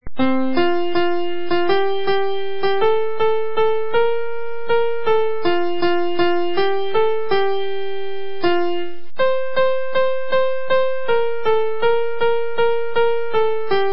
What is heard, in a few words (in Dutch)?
liedje piano